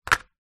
household ice cube tray containing loose ice cubes down on wooden surface